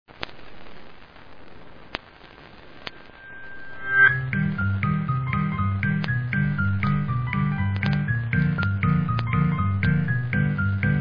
Cartoon Swing

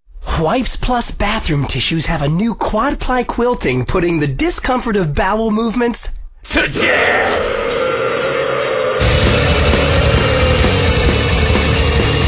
Death Metal

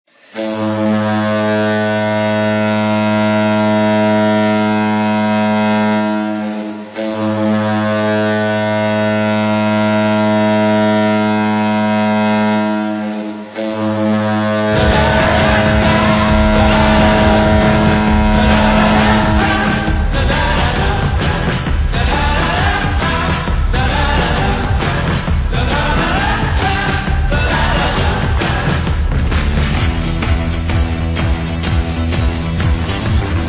Goal Horns; Toledo Walleye

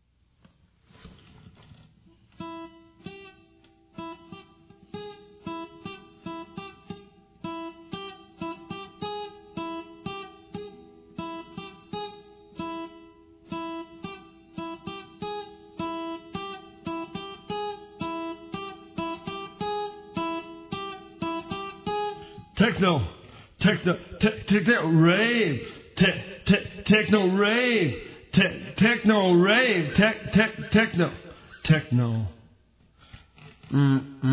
Techno Ravioli

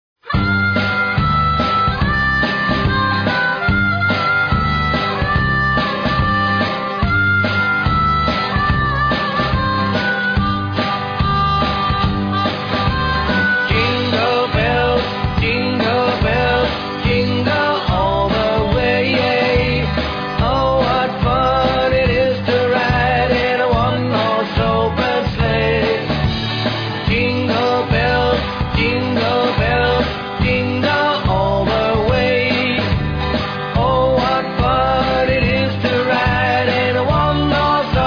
Rubber Band, Jingle Bells

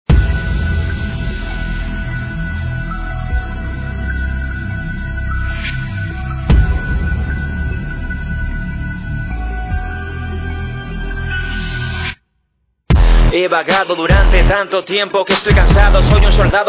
Rap, Spanish vs English; Halo 5

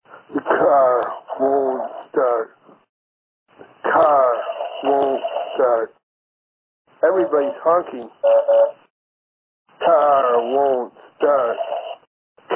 Car Won't Start (Regular Phone)

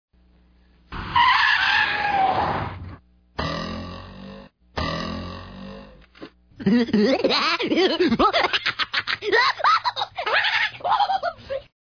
Sound Clip Doyng Laugh

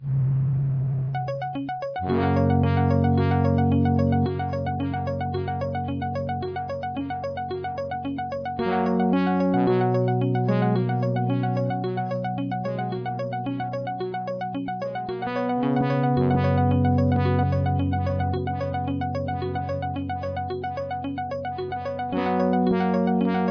Xmas Songs Arcade Punk Remix; Schoolhouse Rock

